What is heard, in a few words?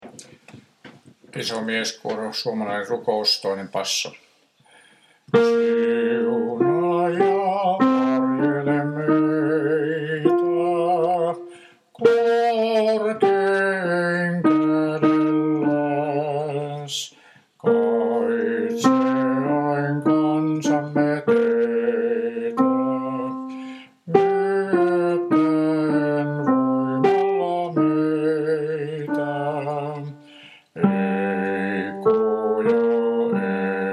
basso; 2. basso; Vetelin poikakuoro